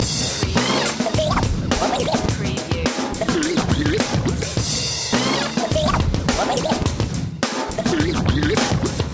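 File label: Scratch 100 BT, beats per minute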